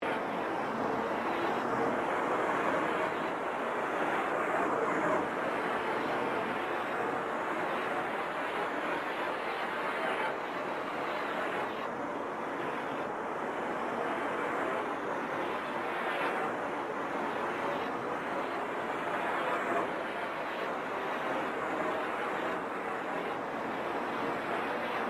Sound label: Created Ambience Stock Exchange Loop, Artificial Sound Effects; Various Ambience created through sound manipulation in FMOD